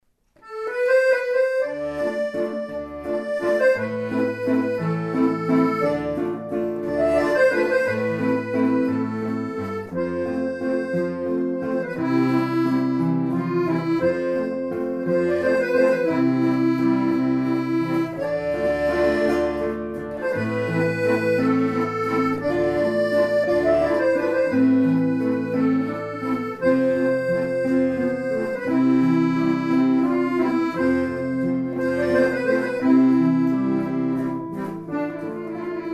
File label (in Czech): akordeon kytara